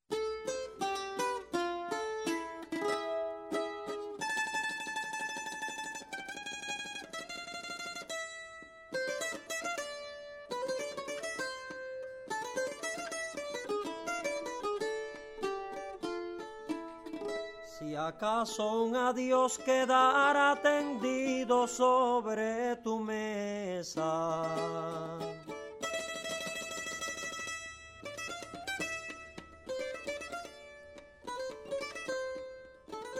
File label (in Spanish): tonada menor; Camagüey; Punto cubano